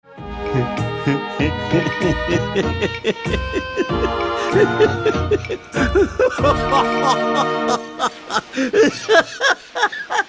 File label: ehhehhehhhehee